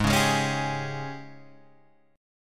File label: Ab Major 7th Suspended 4th Ab down Guitar Open G